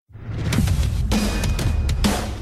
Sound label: drum intro; killer instinct